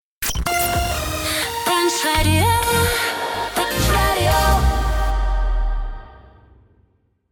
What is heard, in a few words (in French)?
Radio ID Jingle Chante; Punch